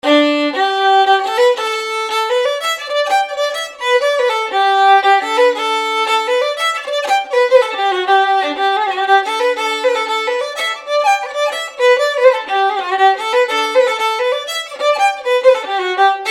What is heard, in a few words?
jig; kesh